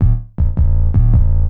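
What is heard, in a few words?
synth bass, drum and bass, 160 bpm, C; eJay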